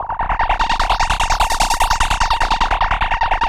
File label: Analog Rhythm